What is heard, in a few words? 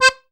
ZUPAN STR, AKKORDEON